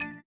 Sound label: VIBRA; Spectrasonics; Metamorphosis